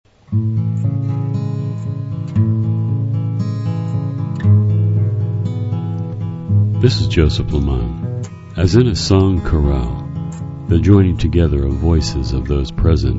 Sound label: Chorale